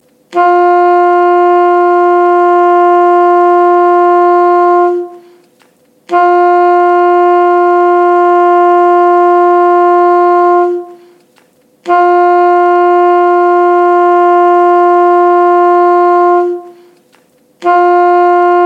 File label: alto sax d